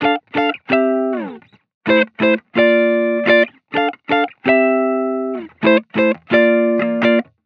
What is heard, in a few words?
Guitar Chord Loop 128 BPM D maj; Instruments; Guitar Loops; Cymatics; EDM Starter Pack